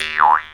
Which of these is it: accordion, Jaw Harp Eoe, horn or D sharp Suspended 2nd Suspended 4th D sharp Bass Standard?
Jaw Harp Eoe